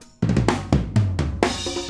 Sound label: Acoustic Fill, Drums